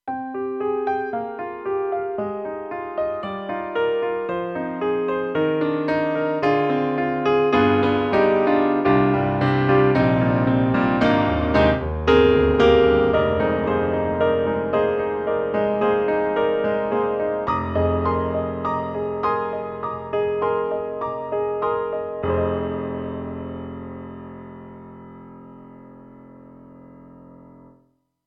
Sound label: Korg M3 Stereo Piano Damper; XPanded